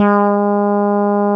Fretless Bass Gb1

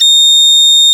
Shakuhachi C6

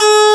Harpsichord C3

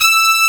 Brass Gb4

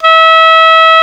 Oboe G#3

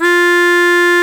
Harmonica A2

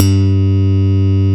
Slap Bass 1 A0

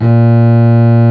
Contra Bass D2

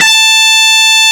Dist Gtr A3

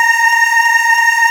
Aahs C4

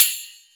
Springy Splash

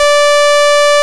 Saw Bass Wave Bb3

